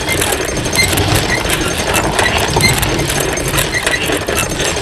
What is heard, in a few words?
hyena wagon loop con cadenas